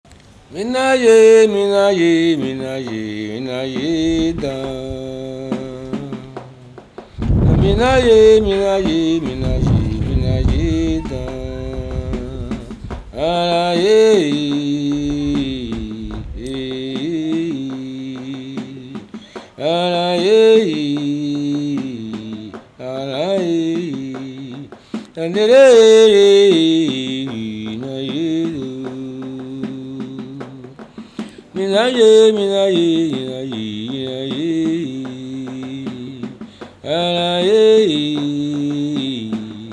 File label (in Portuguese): candomble; fanti